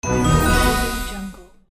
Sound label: slot machine win